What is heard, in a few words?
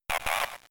Wrap; Pokemon; Gen 3; attack moves; RSE